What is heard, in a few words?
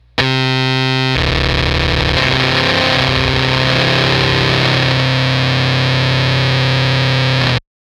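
Fuzztest guitar fuzzfactory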